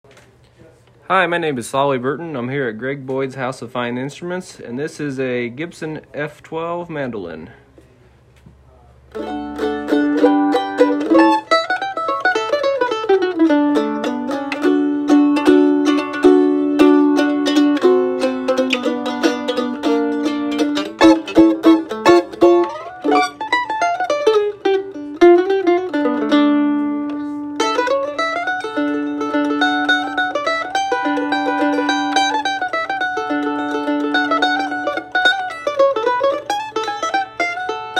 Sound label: Gibson F12; ‘51